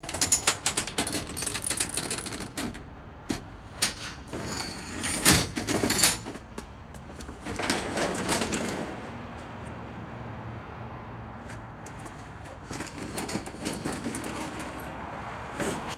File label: metal gurney rattling and clicking with footsteps; mechanical